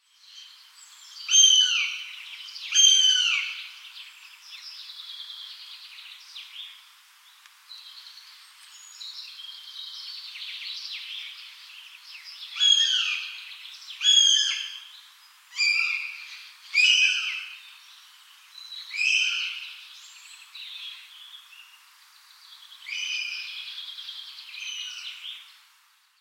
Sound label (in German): Habicht; VOCALIZZAZIONI; falconeria